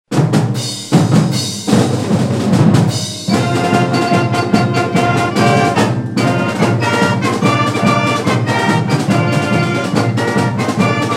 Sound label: Hobart Fight Song